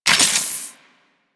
Modular Secondary Handle Reload, Guns; SU Modular Guns; Reloads

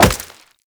Wall Jump Rock; Footsteps; Warframe; Tenno; Wall Run

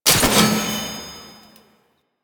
Rocket Launcher Mech; Weapons; DE; Warframe; Tenno; Lasrian

